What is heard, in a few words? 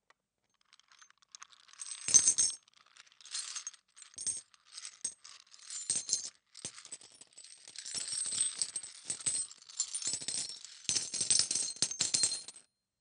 coins repitch; pcm m10